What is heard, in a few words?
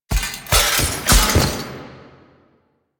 Parry Finisher Foley; Enemies; Finishers; Dax; Warframe; Duviri